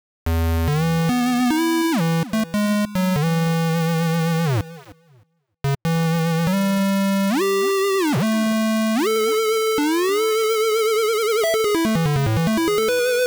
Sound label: chip lead